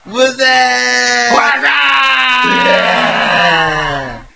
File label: chorus